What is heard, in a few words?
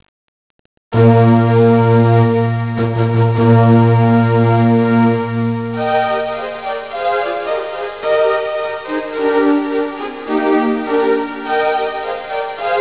unica fanfare